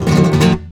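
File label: RASGAND E minor, FLAMENCO Guitars; Spectrasonics; Hans Zimmer Guitars VOL-2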